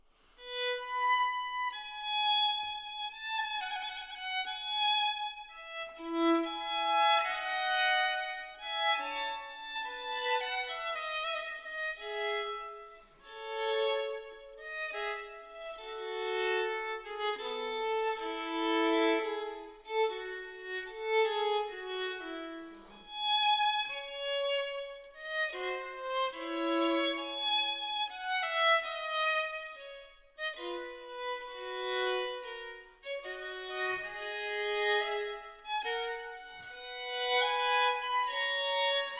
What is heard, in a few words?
violin partita in e major loure; violin partita no 3; bwv 1006